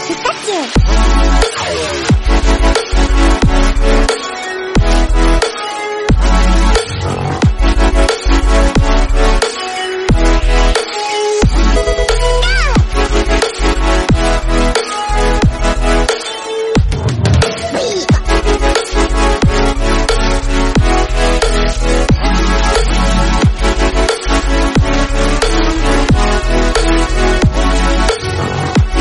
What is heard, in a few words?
trap remix